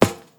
Step Metal Plate

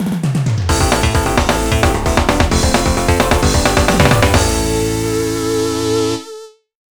Stage Clear; Mobile Force Gungal

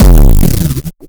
Fx Impact Hive; bass house

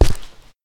Step Squeaky

